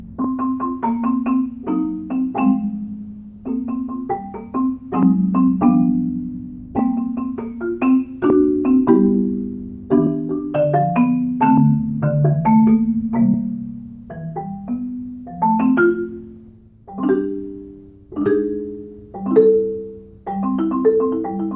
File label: marimba; improv: Marimba